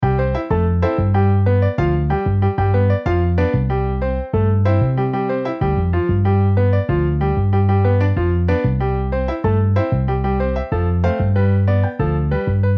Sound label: Piano Bass MIDI